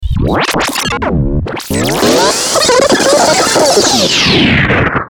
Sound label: Stinger Tuner Distortion; Production Element Imaging Element Accent Transition